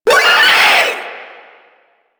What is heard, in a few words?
Vocal Short Attack; Enemies; Warframe; Infested; InfestedNinetyNine